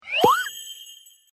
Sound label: growing last stage